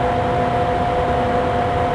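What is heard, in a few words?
ramp move loop; movers platforms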